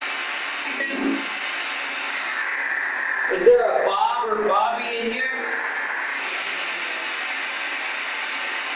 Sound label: moaning